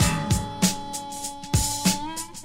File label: harmonica, boombap drum loop; Drum loops